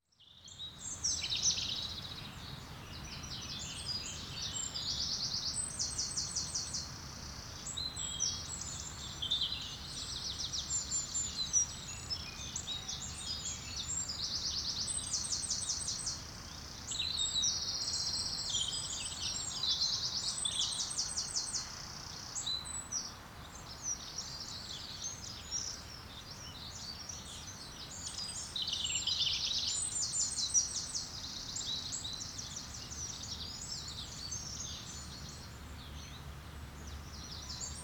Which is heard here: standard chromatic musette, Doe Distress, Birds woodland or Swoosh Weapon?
Birds woodland